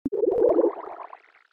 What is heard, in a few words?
tone